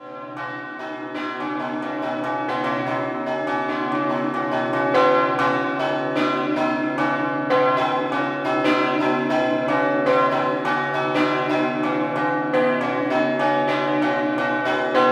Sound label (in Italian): Scampanottata, campane; Latisana